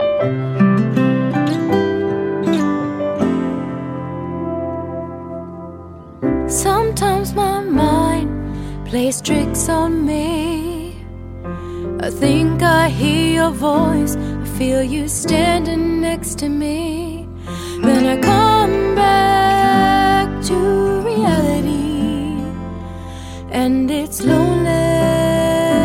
Is Calling Acoustic

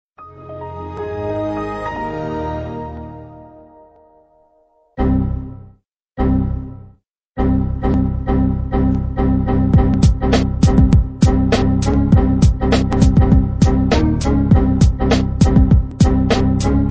windows xp remix; hip hop

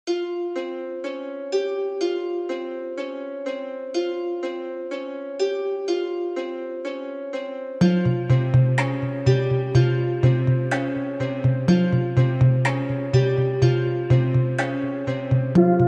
Beats

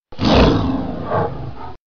growl voice; animals

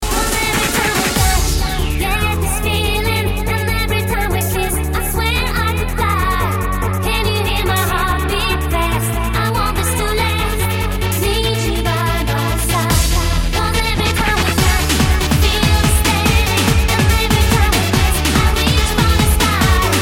trance; quake

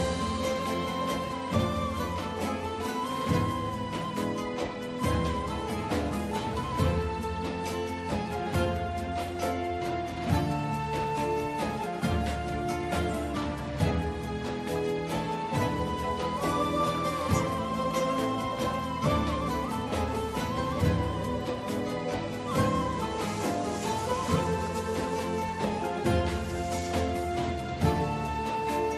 sad music

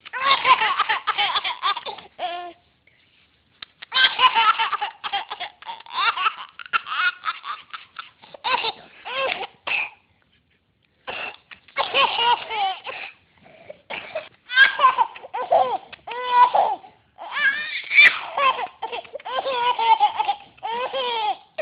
Laugh

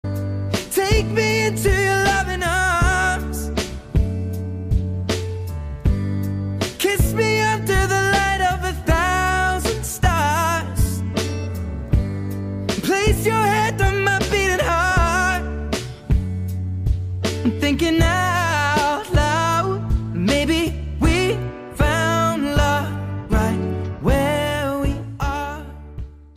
Reggae

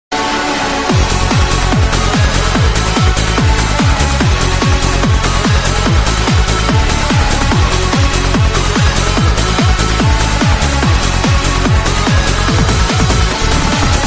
trance

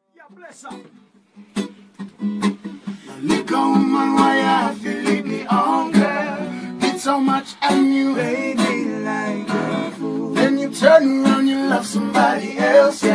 acoustic

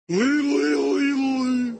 leedle leedle leedle lee

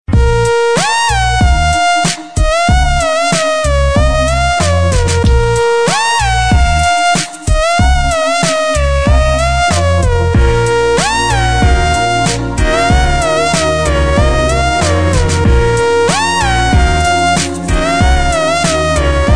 music ring

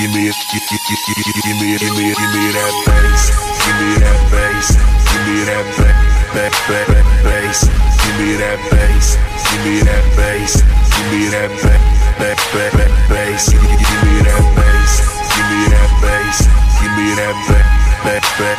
bass

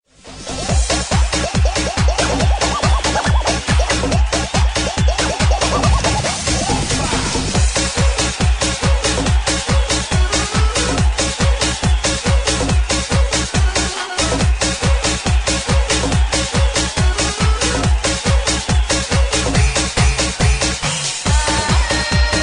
Balkan Dance

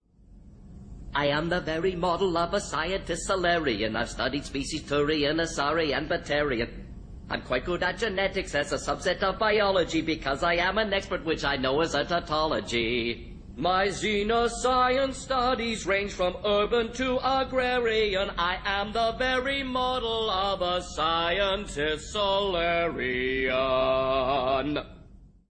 singing scientist salarian; mordin

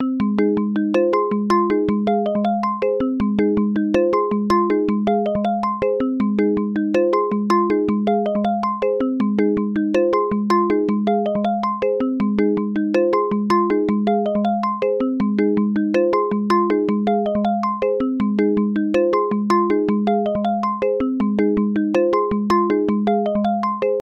Phone ringtone

